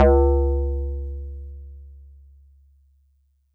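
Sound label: Metal Tube DX100 C#1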